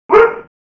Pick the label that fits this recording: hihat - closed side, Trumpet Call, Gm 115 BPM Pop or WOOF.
WOOF